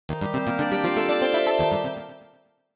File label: start; Grand Fruits